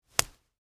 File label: Spring Jacket, Button, Unsnap; Foley, Clothes; Dressing Sound Effects; SoundEffects-BlastWave